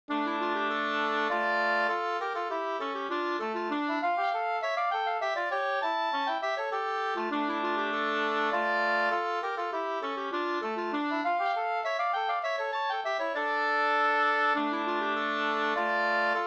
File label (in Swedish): polska Bingsjopolska